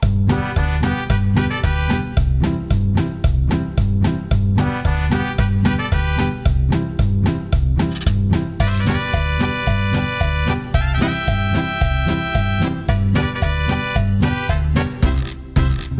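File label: Mariachi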